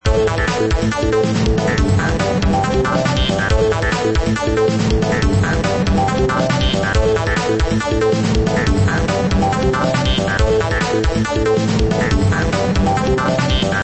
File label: music